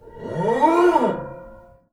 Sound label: String Rub, piano; Piano Miscellaneous